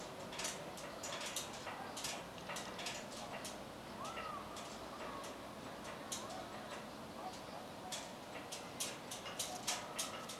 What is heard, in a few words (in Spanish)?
Parque poste Bandera; ParqueTierno